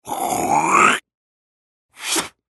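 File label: human spitting sounds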